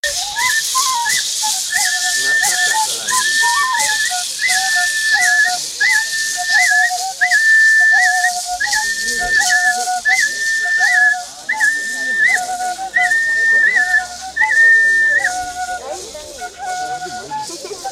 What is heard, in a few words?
pankararus